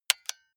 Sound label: console controller xbox rt press fast